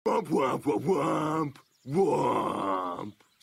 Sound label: womp womp; muscle man